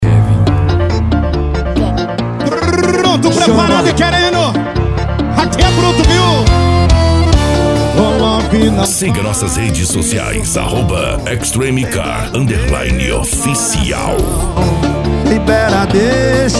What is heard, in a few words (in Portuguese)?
piseiro e sertanejo